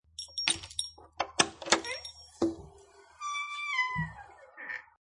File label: Insert Key Turn Key Door Creak Open; Creaky Doors